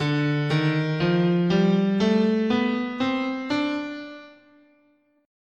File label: D Phrygian sharp6, scale